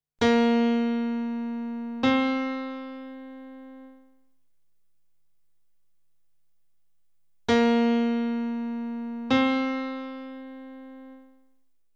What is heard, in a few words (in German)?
Intervalle Sekunde